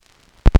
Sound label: vinyl; Masterbits; Vinyl Attack